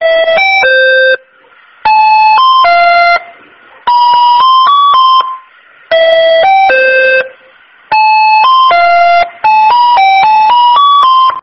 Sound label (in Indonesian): nada dering es krim; walls